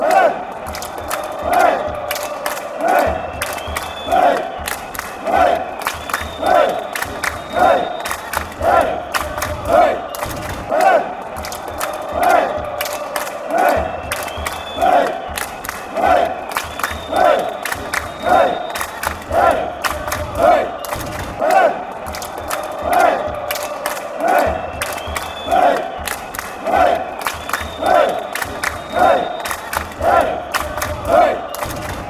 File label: hey hey claps